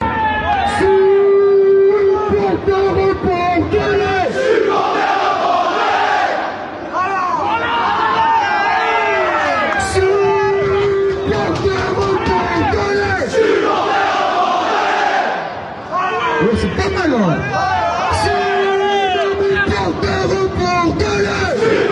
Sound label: wer not gumpet isch en basler hurensohn, fan chants